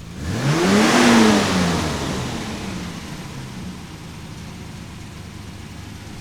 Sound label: IDLE, Auto; trasporti